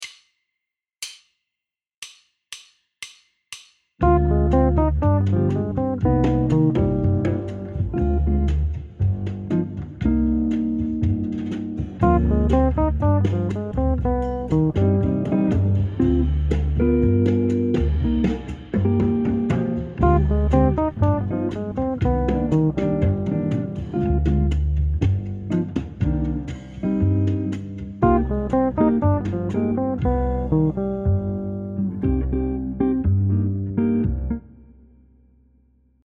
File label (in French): arp sur C min harm; gamme mineure harmonique